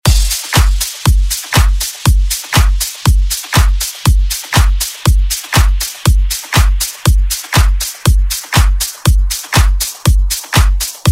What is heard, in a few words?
House Redrum